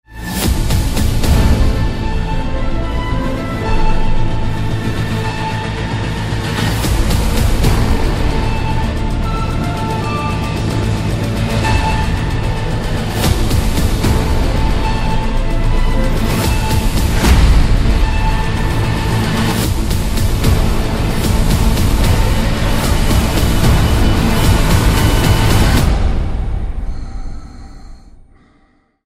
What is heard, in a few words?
ringtone; first man